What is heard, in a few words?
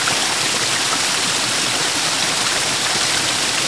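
waterfall small; The Elder Scrolls III - Morrowind; Morrowind GOTY GOG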